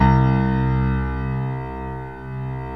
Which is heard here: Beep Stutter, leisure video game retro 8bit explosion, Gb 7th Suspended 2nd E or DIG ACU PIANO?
DIG ACU PIANO